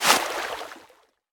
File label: pop out of water; Scrat